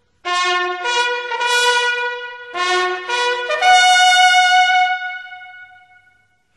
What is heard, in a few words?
Plymouth, navy bugle